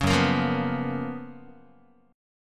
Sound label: Gb Major 11th, down, Guitar; GbM11 strummed; 4,2,1,3,3,x; 4A,4E,5A,5D,5G#,6C#